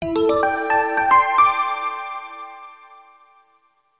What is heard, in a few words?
sound logo